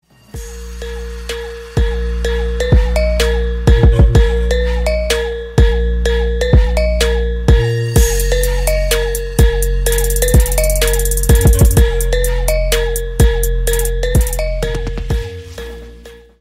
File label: Drift Trap